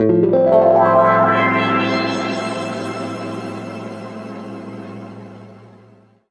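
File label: A pitch shift wah, Guitar Samples; East West; Steve Stevens Guitar Samples Collection; PTCH-SHFT+WA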